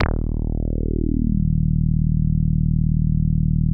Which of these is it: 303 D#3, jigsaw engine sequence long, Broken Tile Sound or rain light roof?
303 D#3